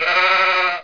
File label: sheep